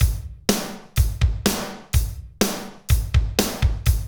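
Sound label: medium tekno, Roland drum beats; Northstar - Drumscapes Roland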